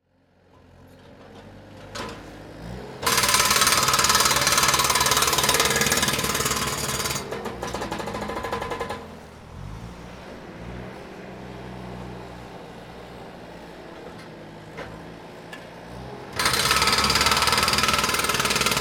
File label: bobcat jackhammer